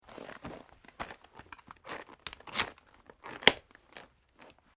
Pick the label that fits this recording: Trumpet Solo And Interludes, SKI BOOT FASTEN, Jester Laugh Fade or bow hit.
SKI BOOT FASTEN